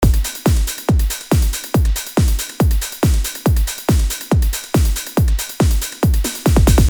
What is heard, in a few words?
full on snare